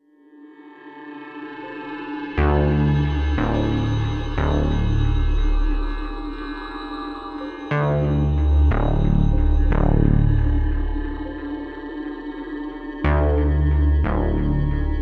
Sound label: Granular Rainstorm